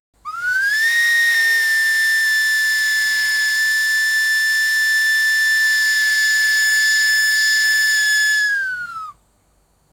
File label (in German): dampflokomotive dampfsign; steam engine